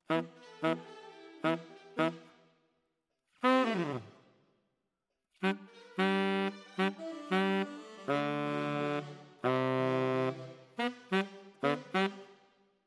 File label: Tenor, RNR for piano